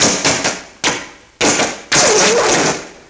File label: zap, debris; XDM